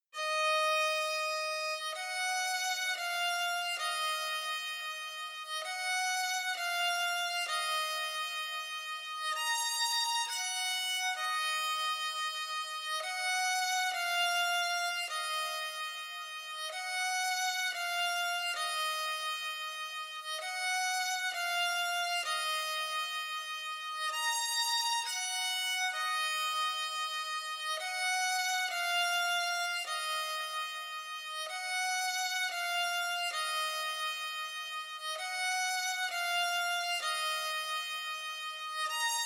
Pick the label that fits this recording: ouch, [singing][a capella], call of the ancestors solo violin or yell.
call of the ancestors solo violin